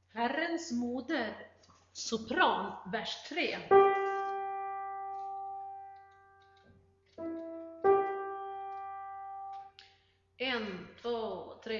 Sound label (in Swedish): sopran; Herrens moder